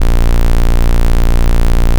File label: tone